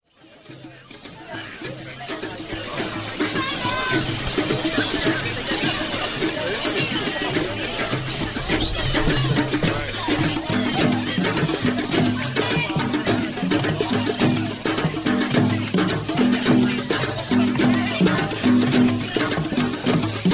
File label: drums